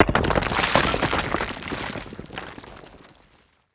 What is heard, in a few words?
stone gib; Rust